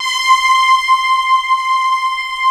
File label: Juno C5, Roland, Analog; USB Soundscan vol.49; Analog Fantasies; AKAI